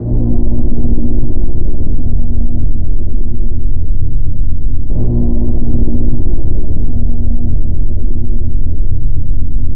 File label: Pipes Amb; imscared